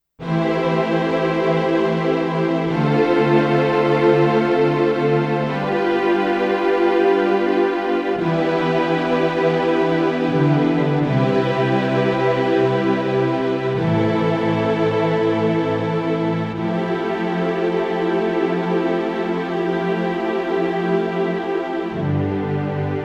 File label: Percussion Bass and Effects